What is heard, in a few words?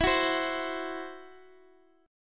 E Suspended 2nd Sharp 5th E, down